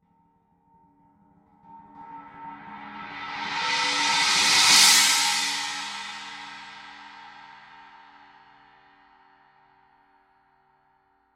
20 novo china roll, paiste 2002

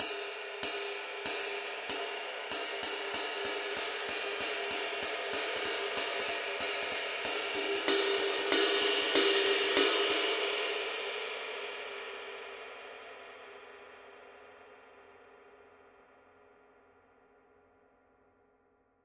22 dark ride pattern; paiste; masters

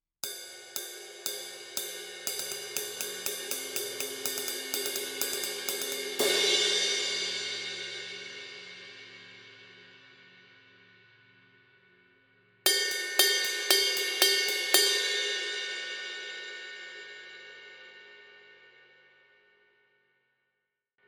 formula 602 classic sounds 20 medium pattern; paiste

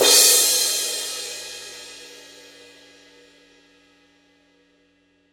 18 medium crash edge, paiste; pst 8

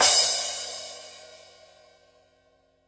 14 thin crash edge, paiste; pst 7

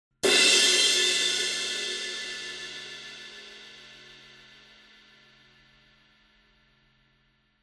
paiste signature 20 fast crash edge

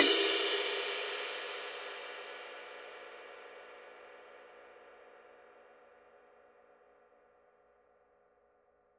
master 22 dark ride bell, paiste; masters

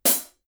14 red heavy hi-hat closed, paiste; color sound 900

